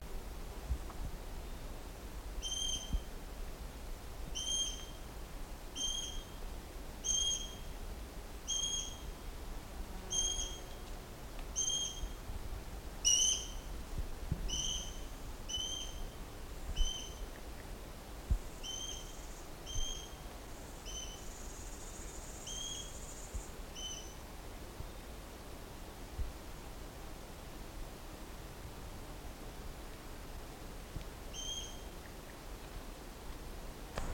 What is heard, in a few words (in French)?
epervier brun, chant